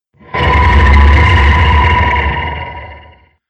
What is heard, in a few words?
Demon Of Distortion, Monster Sound Effects